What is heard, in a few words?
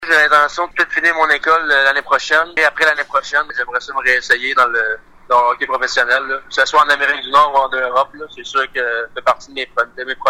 CLAP